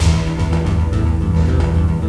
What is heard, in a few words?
Music Loop; MacAttack 1.92